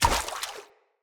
Step Water, Footsteps

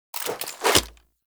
Idle Gun Shoulder Impact; Proto Frame Idle Anims; Quincy

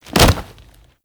Generic Grab; Warframe; Characters; Tenno

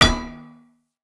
Unarmed Punch Impact Metal Shield; Weapon Impacts; Player; Combat

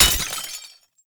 Shotgun Reload; Tenno; Alchemist Shotgun Deluxe

